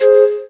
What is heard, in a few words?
calliope, Synths